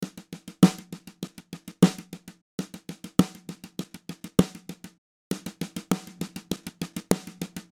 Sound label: Smack Snare